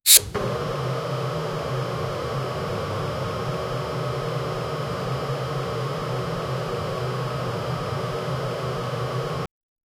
sound of autnomous heater, truck

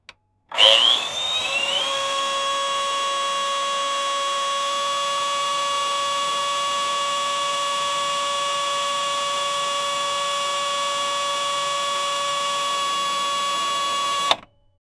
doppelmayr fixed chairlif; electric

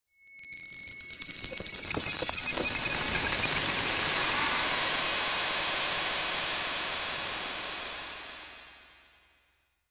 atmosphere

